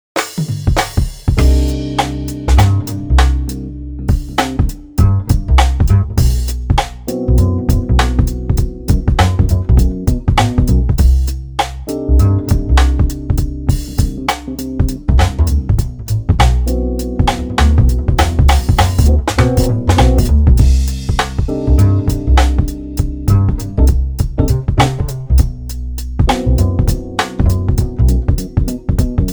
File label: Groove that upright, drums; jazz